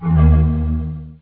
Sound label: windows98 error